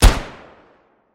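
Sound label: Gun Shot Single Shot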